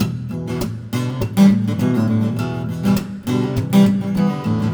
Pull off funk, Acoustic Guitars; ACID MUSIC

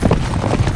horse

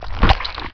Squish; ForsakenMark

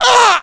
death; willofsteel

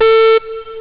beep, rave

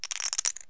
SHUF CHIP, CASINO; KABOOM! - Wild and Wacky Sound Software

